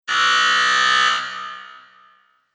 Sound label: Arena Sports Buzzer, basketball